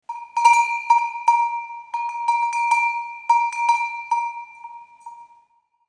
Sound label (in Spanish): cencerro